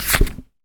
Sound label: click